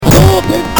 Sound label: sf fireball